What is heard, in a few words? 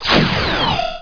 gigashot; MMX4SFX